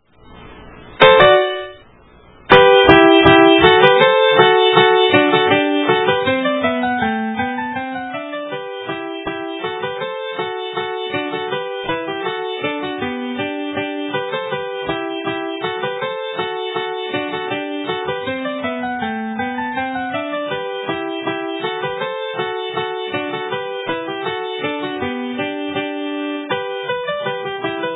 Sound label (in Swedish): Slap